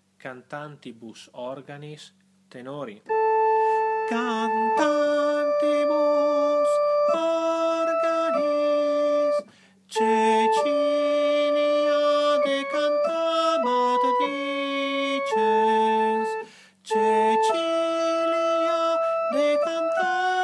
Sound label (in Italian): TENORI